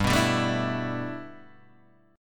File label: G 6th Add 9th G Guitar DADGAD, chord